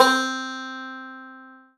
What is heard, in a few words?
Korg TR Rack Banjo C4